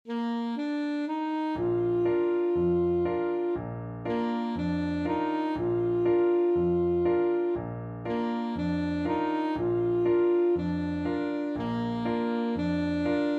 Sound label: saxophone